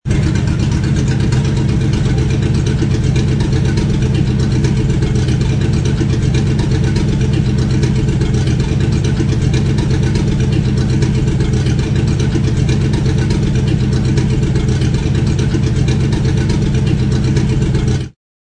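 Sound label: Classic Idle, Flathead Engine Idle; Beautiful recording of a 1950 Mercury - 255 CI - Flathead engine idling; Car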